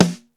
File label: SNARE, DrumBase5000; Crypton; CFZ KIT 2